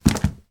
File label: technology laptop charger cable drop on floor